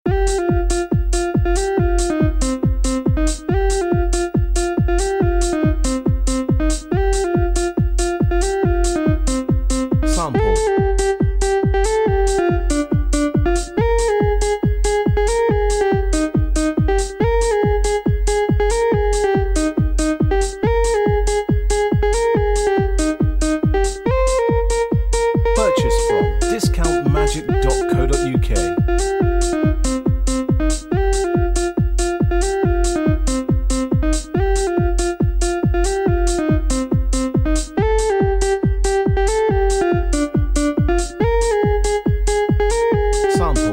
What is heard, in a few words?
techno, backing